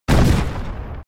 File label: candy hit; tak bites